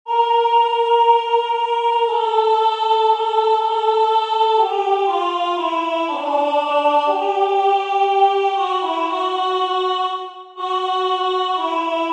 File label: Soprano